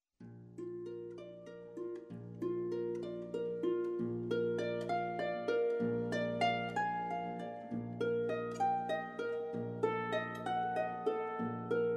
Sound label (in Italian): arpa flauto